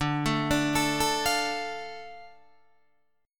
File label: D Minor, Guitar, Open D